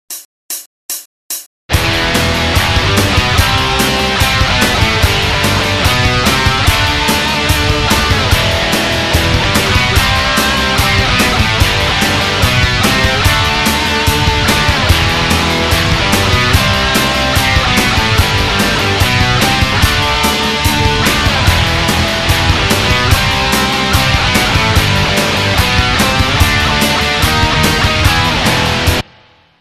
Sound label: metal